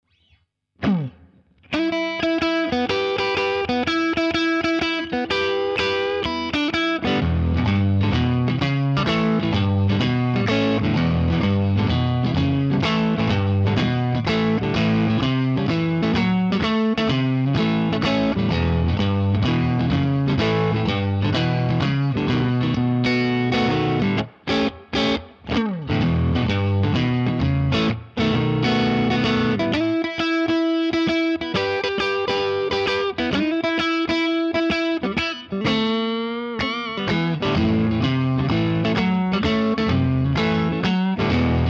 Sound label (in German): Fender Vibroverb Reissue Tuning, Bright-Kanal mit Fender Stratocaster Japan, Reverb; Bluesrock